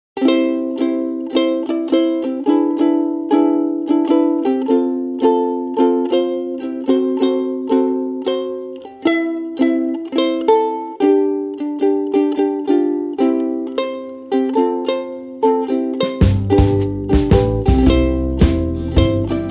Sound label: UKE BLUES